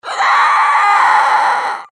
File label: Monster Demon; Animal; Blastwave